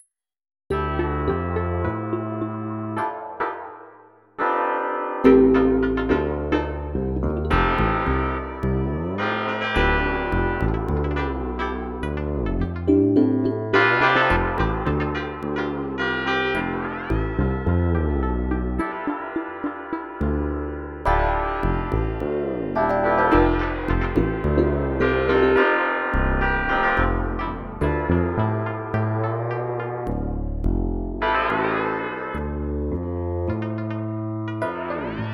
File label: drum